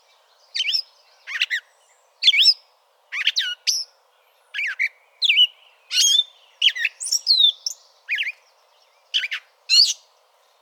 gray catbird song

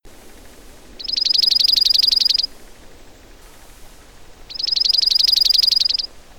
dark eyed junco

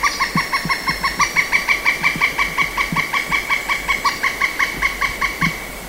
Pileated Woodpecker Call

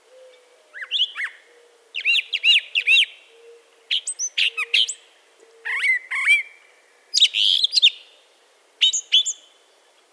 brown thrasher

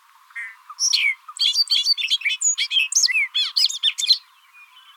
bobolink

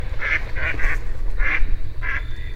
mallard

